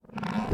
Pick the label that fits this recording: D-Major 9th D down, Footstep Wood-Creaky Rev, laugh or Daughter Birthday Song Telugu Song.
Footstep Wood-Creaky Rev